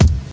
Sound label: short steel kick drum sound D# key